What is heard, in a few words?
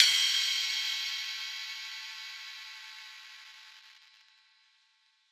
ride; eddyflux